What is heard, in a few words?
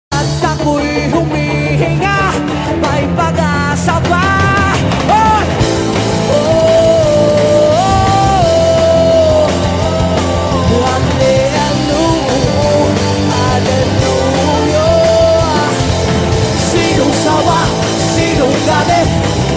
Bamboo